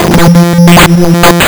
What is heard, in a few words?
lsdj waves; wavetables